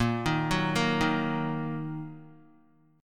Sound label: Bb Suspended 4th Sharp 5th Bb down Guitar; Bbsus4#5 arpeggiated